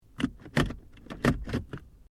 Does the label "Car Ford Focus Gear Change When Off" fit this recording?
Yes